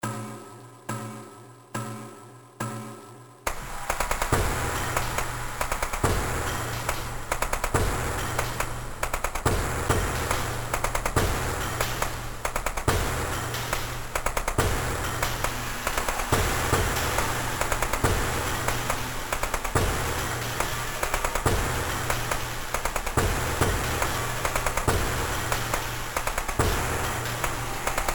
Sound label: drum iceberg drums slowdown; taldrum